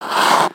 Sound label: ice skate